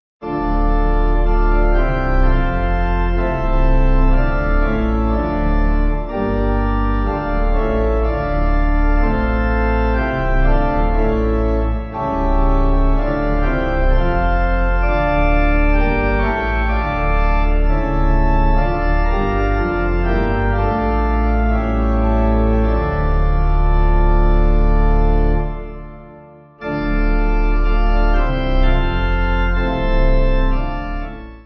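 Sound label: pipe organ hymn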